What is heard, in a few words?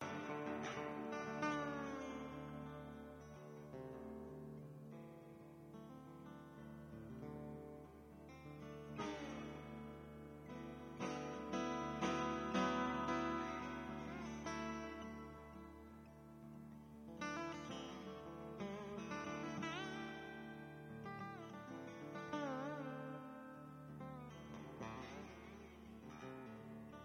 Improv Jam